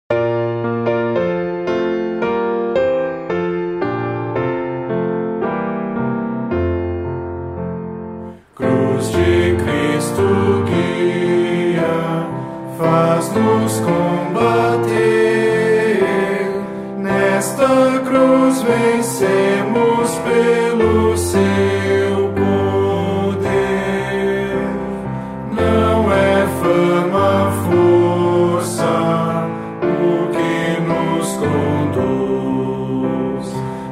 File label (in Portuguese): voz piano